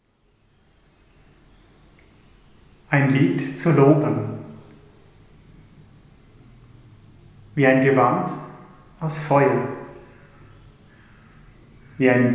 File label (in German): Glocken Impro Rezitation